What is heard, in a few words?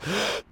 breath